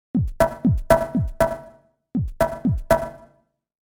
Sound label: Drum Hits Panned Multiple; Drone; 5.1 STEREO MIX; Blastwave